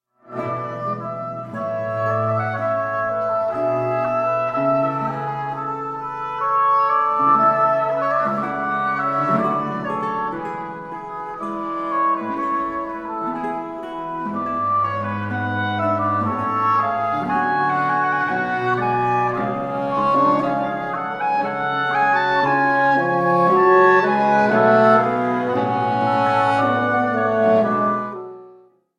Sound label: quartet in b flat for two oboes bassoon and continuo